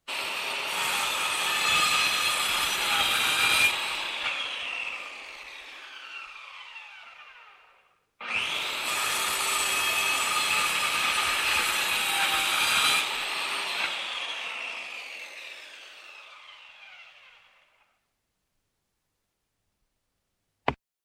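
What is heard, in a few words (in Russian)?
точат топор и удар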